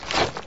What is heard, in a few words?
tackle